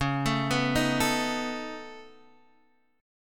C sharp 7th Suspended 2nd Sharp 5th C sharp down Guitar Half Step Down; C#7sus2#5